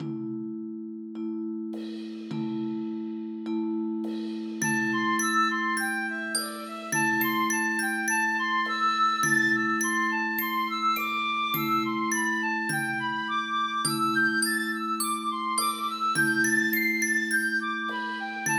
Vietnamese Song and Dance; MTWFC VOL1 Audio Accompaniments

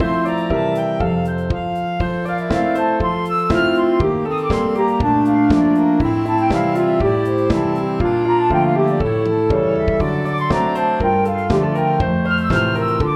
Disco Dance; MTWFC VOL1 Audio Accompaniments

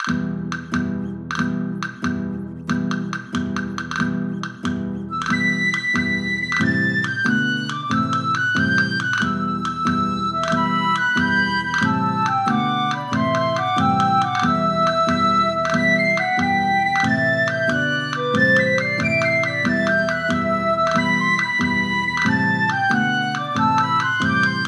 Weaving Song; MTWFC VOL1 Audio Accompaniments

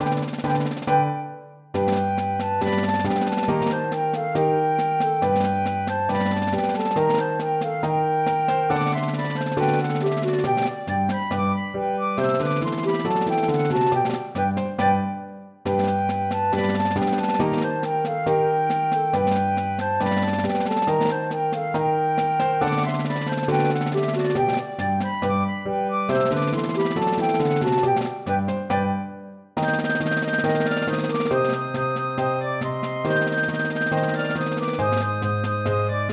Song & Dance; MTWFC VOL2 Parts1&2 Audio Accompaniments

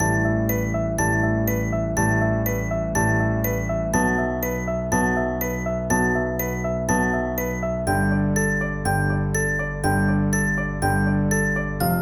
Spinning Dance; MTWFC VOL1 Audio Accompaniments

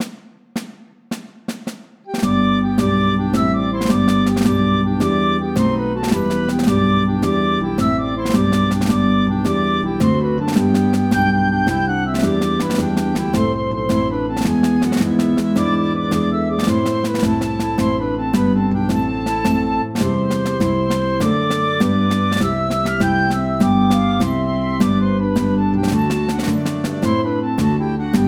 Farewell Song; MTWFC VOL1 Audio Accompaniments

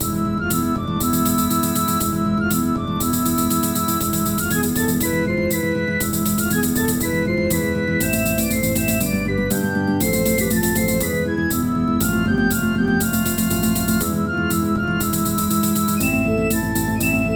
Greek Dance; MTWFC VOL1 Audio Accompaniments

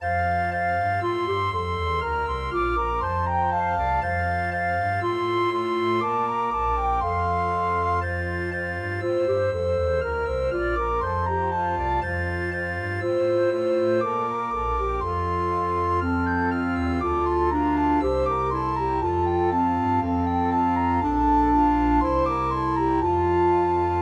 Celebration Dance; MTWFC VOL1 Audio Accompaniments

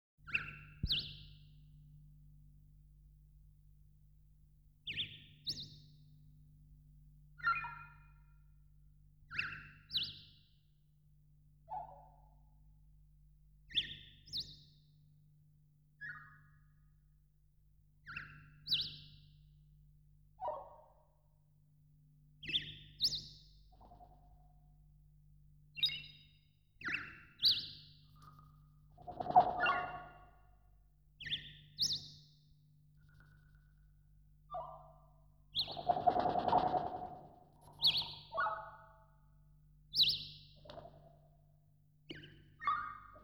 Backing track

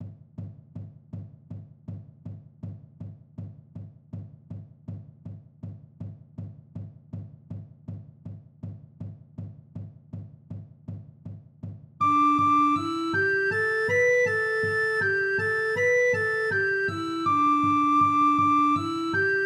Circle Song; MTWFC VOL2 Parts1&2 Audio Accompaniments

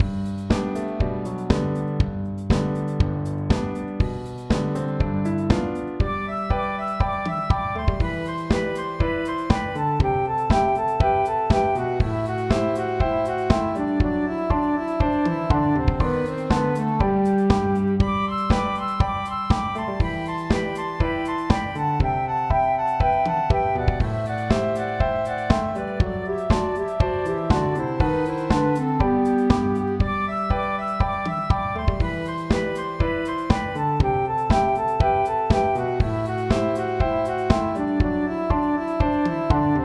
Circle Dance; MTWFC VOL2 Parts1&2 Audio Accompaniments

